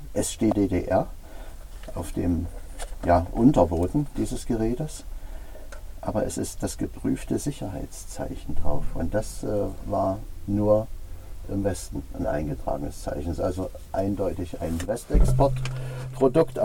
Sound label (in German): Luftdusche HFG 600